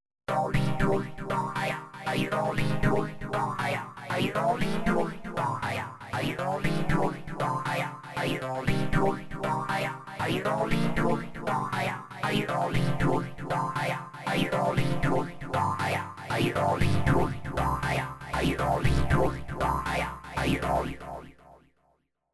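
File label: RK100S Vocoder Preset; Tlkvoxer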